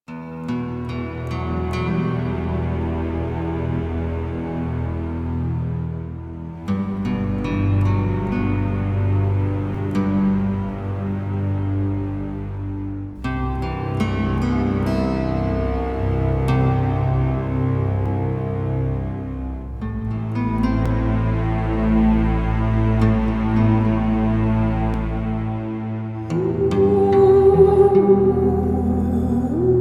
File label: menu music loop; Warframe; UI; Duviri